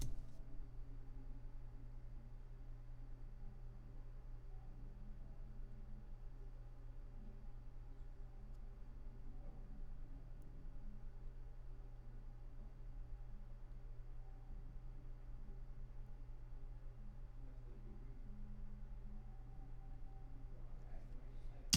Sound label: room tone